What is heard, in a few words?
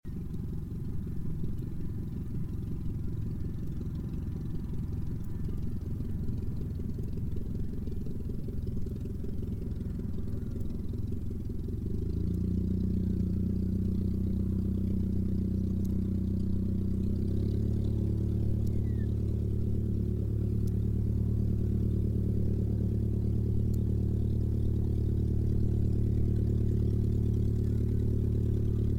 aircraft auster iii preflight; 1945